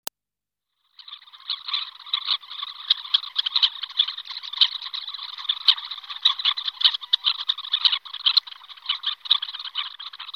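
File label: red-necked phalarope voice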